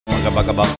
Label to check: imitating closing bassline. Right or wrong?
Right